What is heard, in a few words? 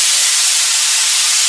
Steam Vent